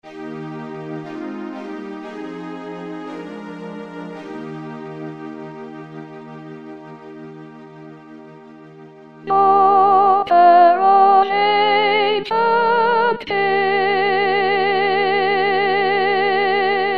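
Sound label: sopranos; Elgar; Coronation Ode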